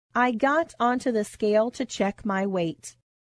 scale

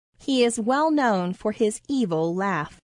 laugh

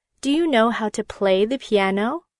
piano

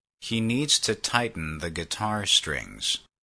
string

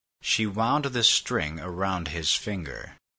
string

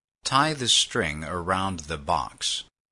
string

